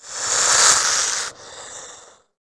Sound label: PERCEPTION